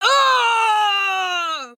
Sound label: Falling